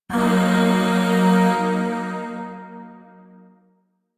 Shawzin Yo Chord C; Music; Chords; Warframe; Zariman Void Shawzin; DE

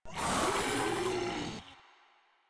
Infested Ancient Vocal Pain

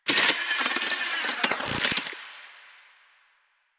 Cave Hi Tech Clicks; Ambience; Warframe; Stalker Cave

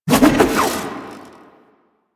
Staff Spin Swing; Warframe; Duviri; Dax; weapons

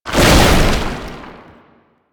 Tank Infested Melee Swing; Enemies; Abilities; Warframe; Lasrian

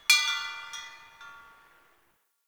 Chimes, Ambience; Midrath Island; Fey Hub

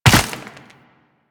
Land Ice, Footsteps; Warframe; Tenno

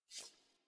UI Corpus Theme Grid Open; DE; Warframe

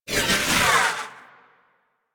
Osprey Dodge; Warframe; Corpus

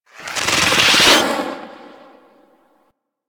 Swarm Missile Projectile; Enemies; Warframe; Eidolon; Jetpack Marine

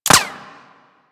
Bullet Impact Metal; Warframe; Weapons

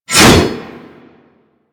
Axe Heavy Swing; Warframe; Weapons

